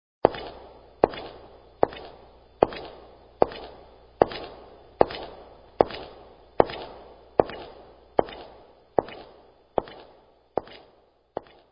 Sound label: Foot Steps